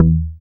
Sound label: BAS MKS-50, Roland